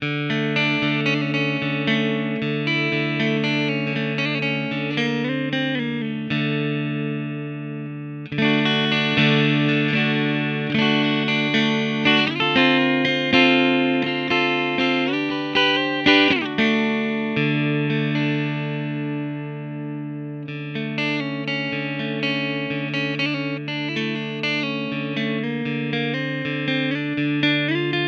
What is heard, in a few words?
Godin Session Strat Mid Position